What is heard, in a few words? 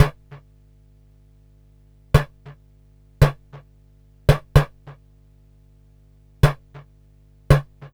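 snare drum, drum loop; Big Fish Audio; Uncivilised G'rooves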